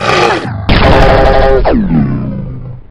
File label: gonome pain; gonome hev